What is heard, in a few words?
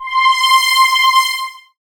synth c, Sweep Synths